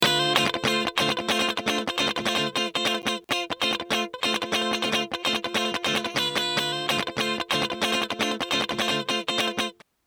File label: Clean Strat Comp; Heritage Audio HA-609A